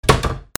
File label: DOORS